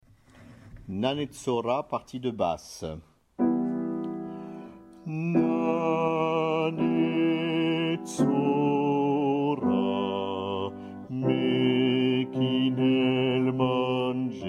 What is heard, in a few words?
bas